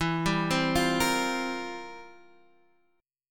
F Major 7th Suspended 2nd Suspended 4th, E, guitar